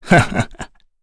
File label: Laugh, Vox; en; Kain; kingsraid